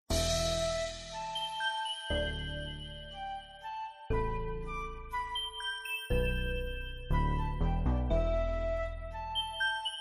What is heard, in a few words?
forest bg music; Below Kryll